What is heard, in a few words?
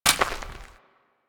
Step Dirt, footsteps